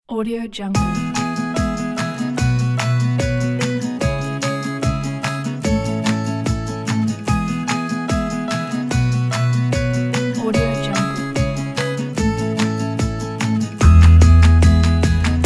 bright and funny

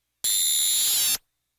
synth bursts multiple versions short

